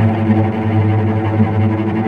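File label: tremolo, strings; East West; The Ultimate String Collection; VCS TREMOLO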